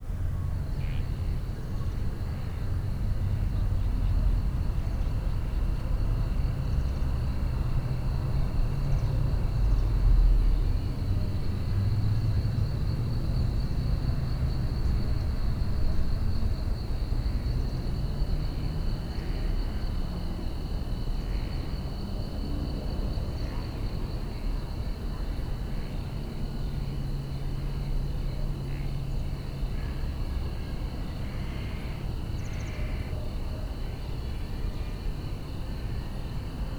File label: Amb Outside amb; Devil Express